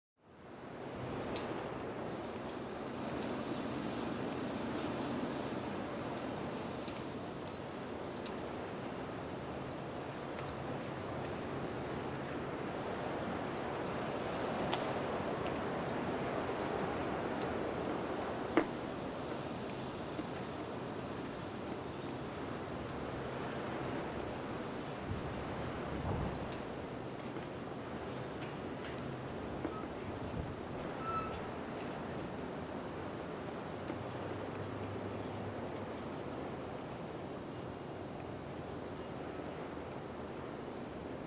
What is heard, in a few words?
playground wind; Ambient and Specific Samples